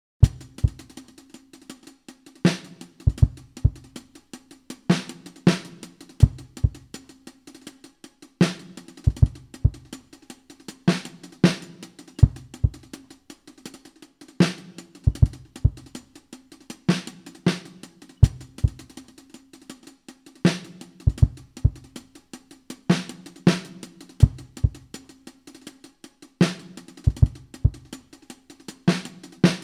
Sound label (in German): 80BPM, Loops und Beats; Esche: nur Drums